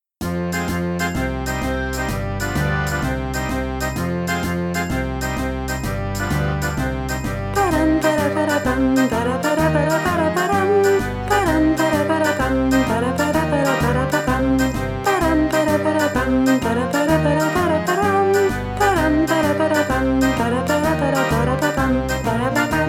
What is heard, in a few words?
Tarantella